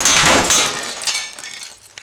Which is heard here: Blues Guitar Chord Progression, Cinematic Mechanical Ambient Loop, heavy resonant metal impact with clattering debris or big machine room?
heavy resonant metal impact with clattering debris